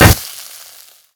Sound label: sand impact, Bullet Impact; Dystopia; physics